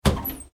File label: Foley Medicine Cabinet Door Open; Cabinet Doors; Sound Effects:Doors